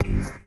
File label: G STAB, funky; Loopmasters; Funky Underground Vol 2